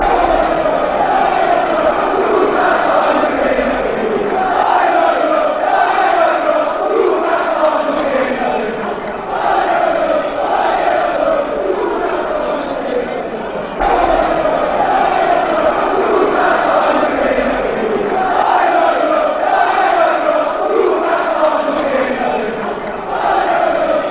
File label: oy oy oy, chants